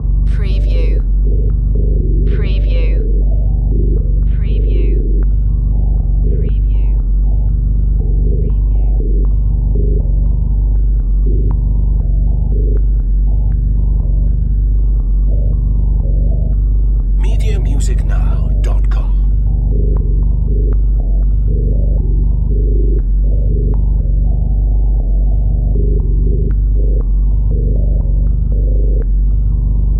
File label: Evl Rndm Bass